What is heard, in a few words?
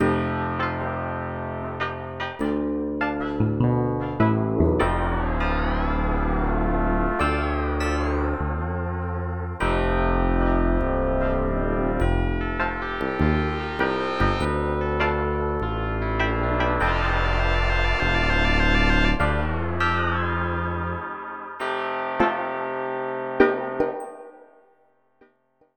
drum